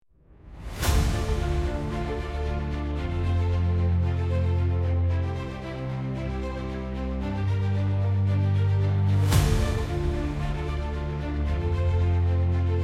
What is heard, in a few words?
into the abyss, epic